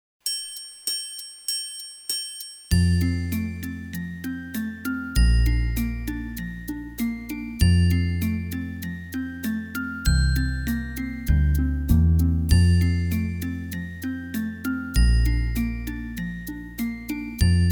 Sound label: Alberti Bass Study